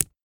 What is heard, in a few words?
marbles x2 hit